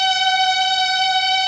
MEL STR G4

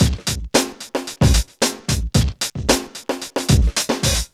POWERJAM 112, Funky Elements loops; Zero-G

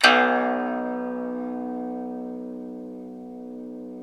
SU GZHENG, Earth Tones

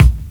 KIT, Dance Drums; Best Service

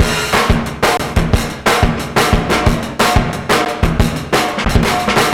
180 bpm, breaks; Fast n' Bulbus; Zero-G Beneath the Planet of the Breaks

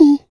FEM PERC, Vocal Jazz FX

